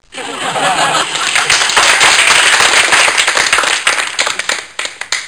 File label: Applause Only Small Crowd; Human